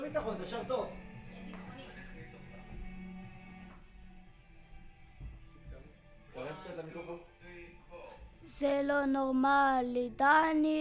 VOC

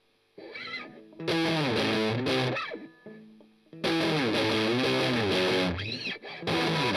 Strum Octav

